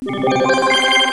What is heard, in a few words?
SPACE